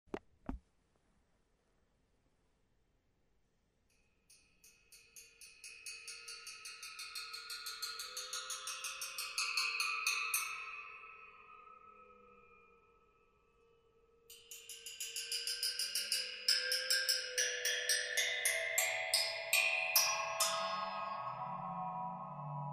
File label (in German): waterphone draht